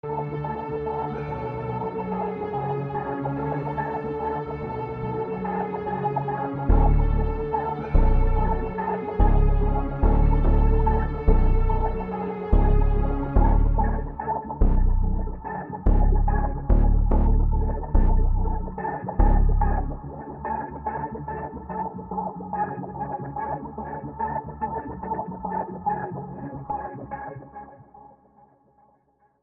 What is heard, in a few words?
Evil Boi 144 F minor dark vibey